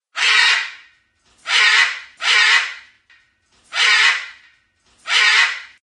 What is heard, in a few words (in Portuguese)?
sons de araras